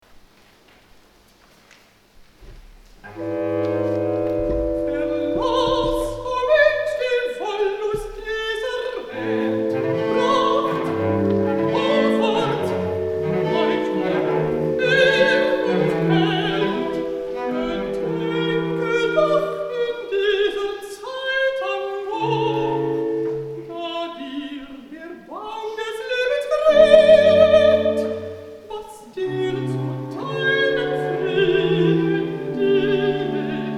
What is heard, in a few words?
Recitativo A; BWV020 O Ewigkeit, du Donnerwort